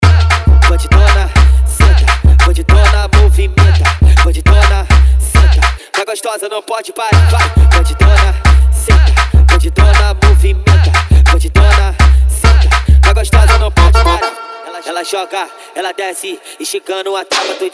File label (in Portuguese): especial funk mega bass